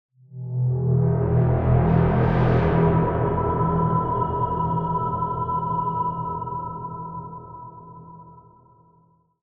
Pad, Sylenth1; Routed Sound; Sylenth1 Expansion - Doom Carpenter Vol.1

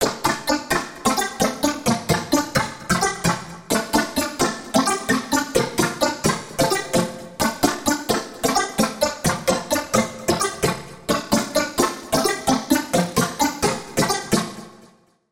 Pluck; Digit Curse; Routed Sound; Vital Expansion - Hyper Gamer Vol.1

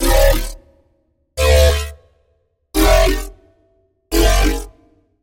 Wobble - Wild Mechanical; Routed Sound; Serum 2 Expansion - Chaos Bringer Vol.1

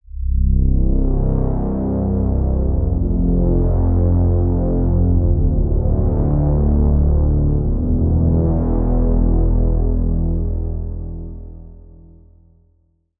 Pad, Sylenth1; Pad - Bottom Of Black Hole; Routed Sound; Sylenth1 Expansion - Star Scavenger Vol.1

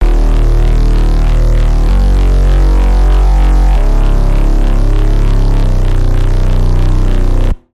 Bass, Spire; Metallicity; Routed Sound; Spire Expansion; Star Scavenger Vol.1

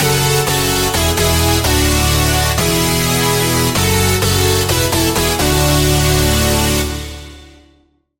Lead; Routed Sound; Serum 2 Expansion - Star Scavenger Vol.1

